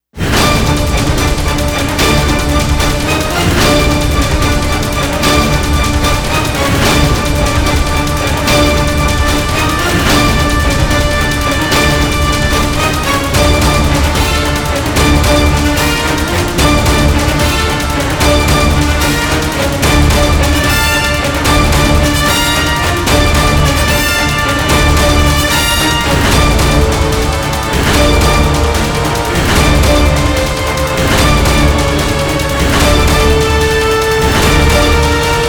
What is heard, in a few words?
no choir